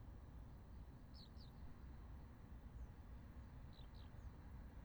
skylark multiple calls, night flight calls in beijing